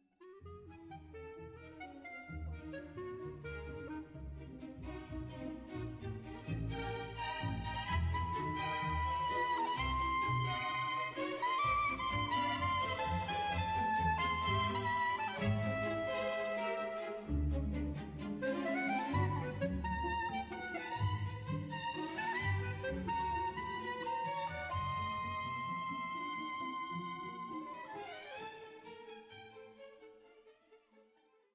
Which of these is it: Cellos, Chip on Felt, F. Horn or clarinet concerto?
clarinet concerto